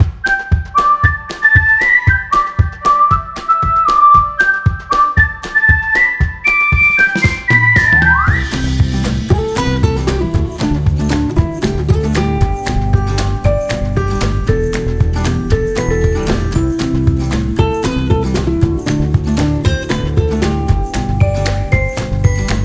Happy Whistle; chillout; childrens-music; electronic

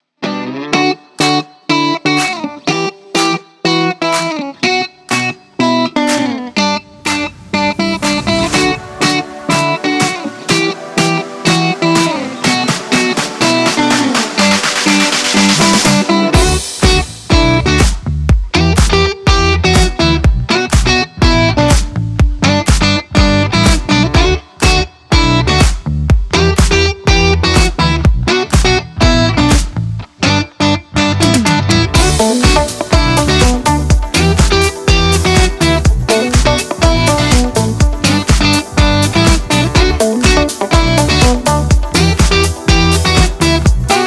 Uplifting Guitar Travel

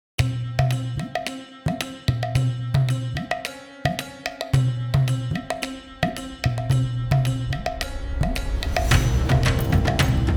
India Epic Action Drums

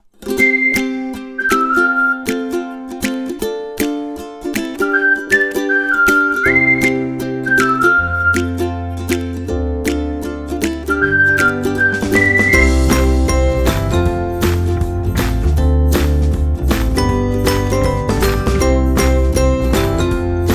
Upbeat Ukulele

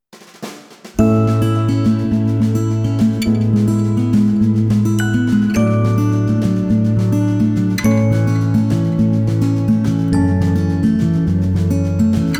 Acoustic Positive Romantic

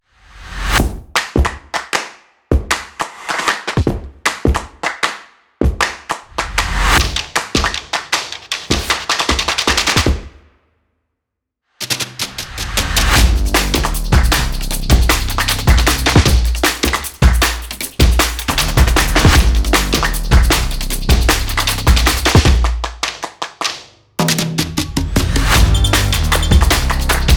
Energetic Drums

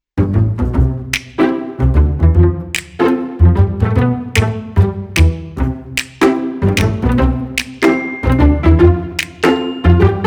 Happy, Cute And Playful

